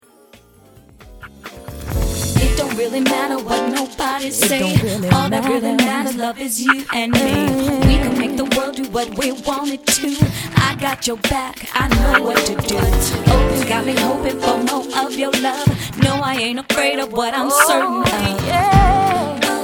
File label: Neo Soul urban jazz